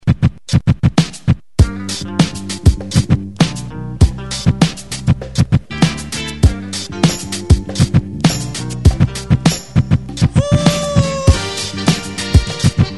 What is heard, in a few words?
60s re drum 100 Bpm